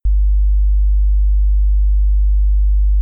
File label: pure tone 50 hz; acoustic beats mismatched musical frequencies